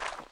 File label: footstep; Mount&Blade Warband